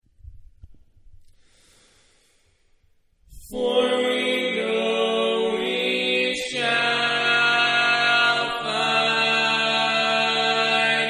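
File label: Tag Baritone, barbershop tags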